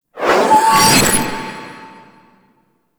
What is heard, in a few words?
Glass Shank Sweep; Warframe